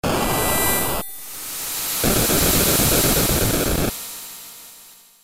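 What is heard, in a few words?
explosion; ff3; ff3us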